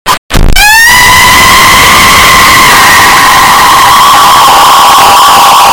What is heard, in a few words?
chicken on tree screaming meme